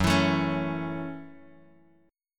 F sharp Minor 7th F sharp, Guitar Drop D

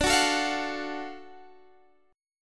Gb Minor Major 7th Sharp 5th, guitar

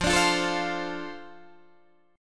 F 6th F down Guitar Open D

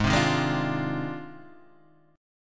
F Minor 13th, G sharp

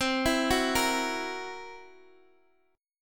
C 7th Flat 5th C down Guitar Open D

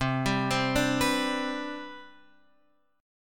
C Major 7th Suspended 2nd C, chord; CM7sus2 Chord {8 10 10 7 X 7} arpeggiated

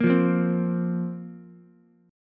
Bb Suspended 2nd Bb Ukulele; Bbsus2 strummed; JGuitar

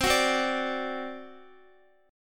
C Suspended 2nd Flat 5th C Guitar; strummed; 4F,4A,5C,5G,5C,6E; x,x,12,11,12,10; JGuitar

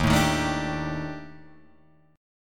D sharp Major 7th Flat 5th, down, chord

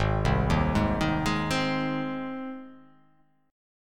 G sharp Major G sharp, chord

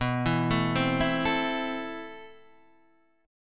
A Minor B, guitar; Am/B

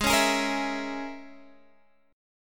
A sharp Minor 7th Flat 5th G sharp

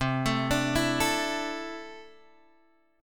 C 6th Add 9th, C, down, Guitar, Open G

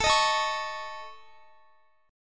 A Major Flat 5th, Guitar; AMb5 strummed; x,x,21,20,21,21; 4F,4A,5C,5G,5C,6E

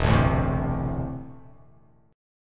E 7th Suspended 4th Sharp 5th

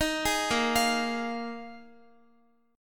Bb 7th Suspended 4th Bb Guitar 4E,4A,5D,5G,5C,6F; arpeggiated

